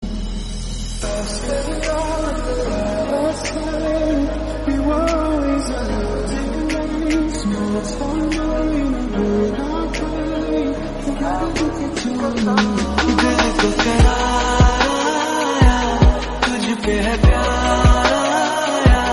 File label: sad, hindi